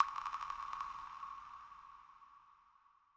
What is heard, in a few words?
water droplet impact; fx; bespoke - Floral v.1